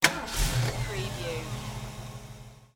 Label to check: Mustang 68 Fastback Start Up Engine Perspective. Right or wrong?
Right